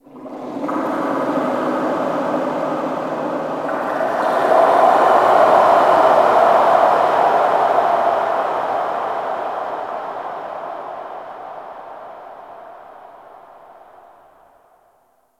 Ocean Underwater Texture Medium; Warframe; Grineer Ocean

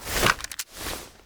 Equip; The New War; Lua Valley Ext; Burston; Cinematics

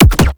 Explosion Punch Generic Extra Large; Explosion Punch Layers; Weapons

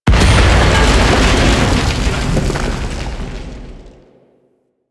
Outpost Machine Destroy Rock Fall; Warframe; The New War; Narmer Outpost

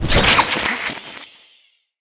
infested fishing spear throw; Weapons

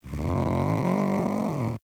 Catbrow Feral Vocal Idle; Enemies; Warframe

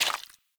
Pirate Footstep Default; Warframe

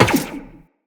Run Zipline, Footsteps; Characters; Tenno

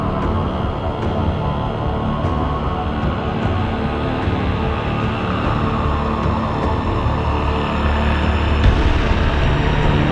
Ambient Cue; Entrati; TennoCon 2023; Quest MITW

